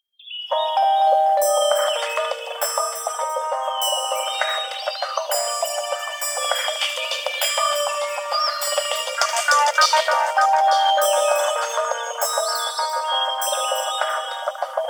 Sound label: Forest Rain, ringtone; sky ringtone